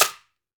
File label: AMAZON SHAKER, Latin Shakers